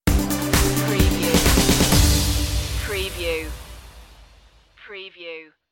new life stinger

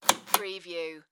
Light Pull Chain Off; Sound Effects Bible

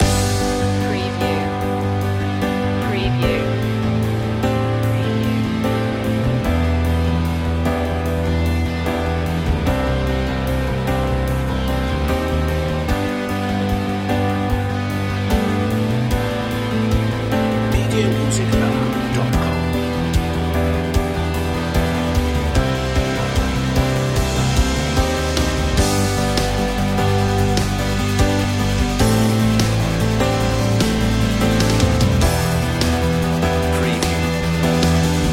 piano pop

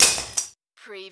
Button Sound - Metal Wrench Fall

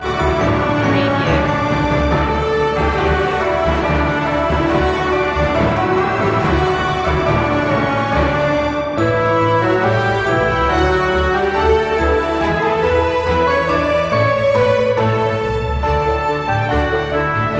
orchestral; deck the halls; media music now

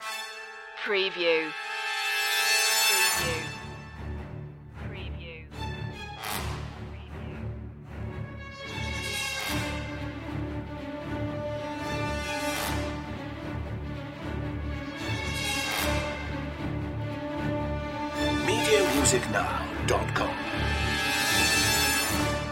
war of attrition no choir no perc

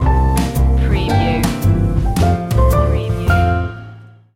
go tell it on a mountain jazz stinger

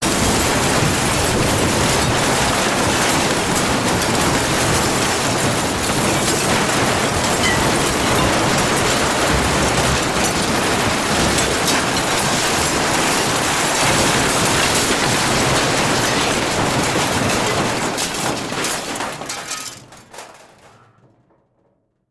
Crash Mass Destruction

